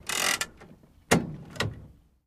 download Sound, Chevrolet Corvair, Interior Pov, Set Parking Brake Slow, Release.